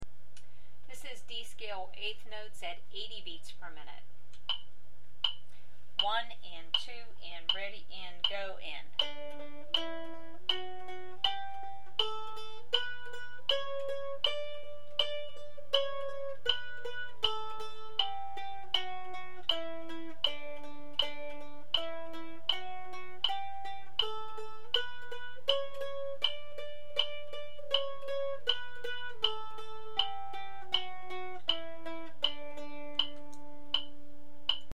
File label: D Scale 8th Notes 80bpm, mandolin